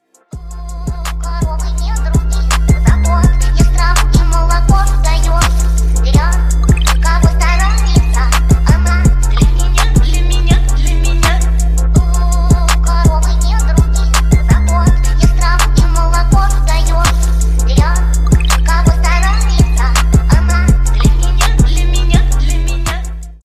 phonk remix

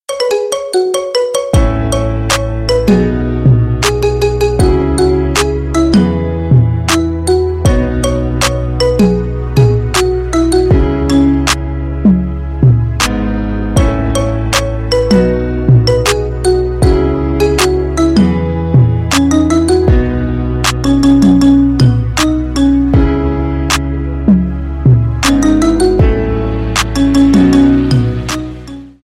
marimba remix

